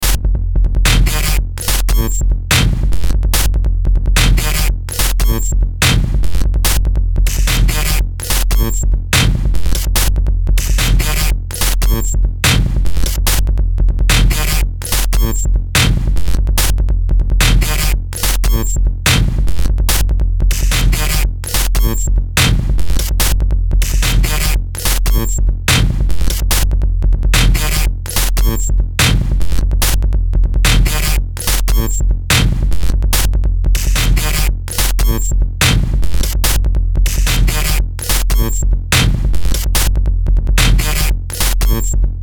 BEAT ACID TECH, Beat Loop Acid Techno Electric Glitch